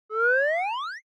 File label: Motion Slide High